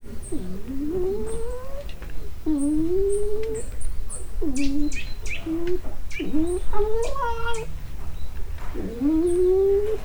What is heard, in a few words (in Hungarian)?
simogatást kérő, vörös róka; állathangok; miskolci zoo 2009